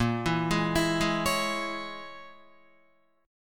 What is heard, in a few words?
Bb Minor 7th Flat 5th, Guitar; Bbm7b5 arpeggiated